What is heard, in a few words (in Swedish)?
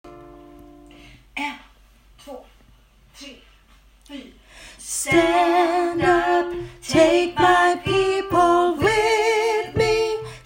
sopran